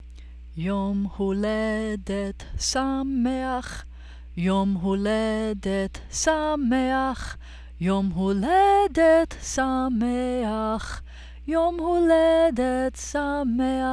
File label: Happy Birthday Hebrew